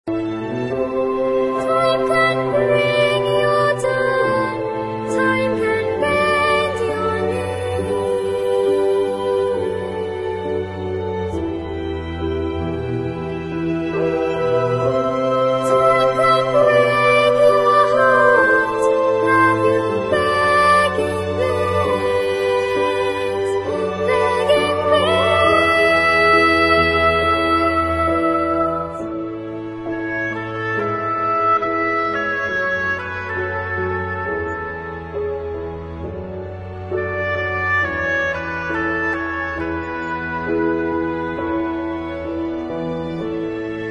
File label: the choirboys